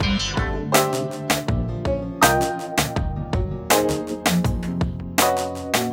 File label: Melody Red, Synchro Funk, Beats; Sonic Foundry (Sony Creative Software)